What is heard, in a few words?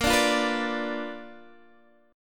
B-Minor Major 7th Flat 5th Bb